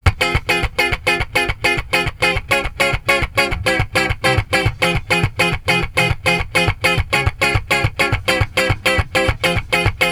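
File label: Guitar, Jazz for piano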